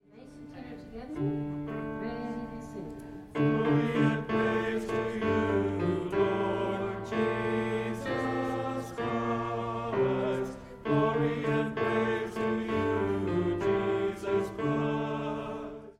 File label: bass and tenor; Lenten Gospel Acclamation MOR